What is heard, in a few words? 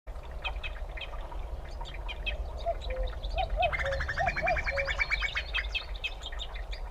Common Cuckoo Cuculus canorus